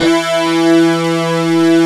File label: LINEAR SYNTH